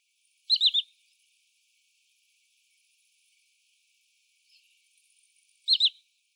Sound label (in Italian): Piro piro boschereccio Tringa glareola